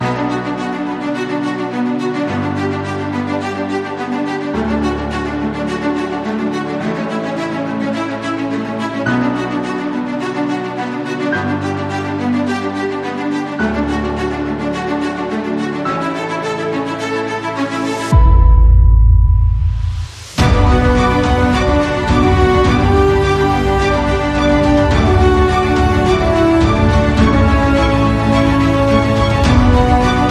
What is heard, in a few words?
Action Adventure Epic Trailer; Bootflare